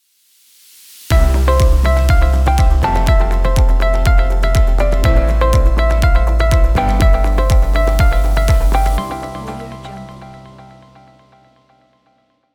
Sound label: corporate logo